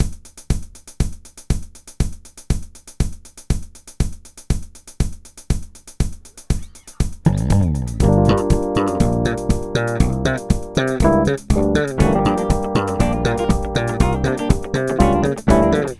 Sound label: sample, moog